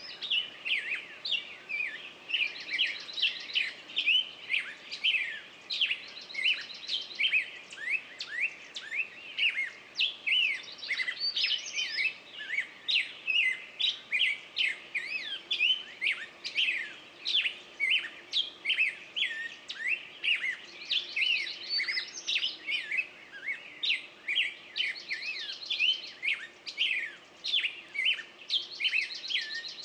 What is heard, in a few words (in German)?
Natur Klangwelten Voegel am Morgen auf der Wiese; naturgeraeusche; Silentsubs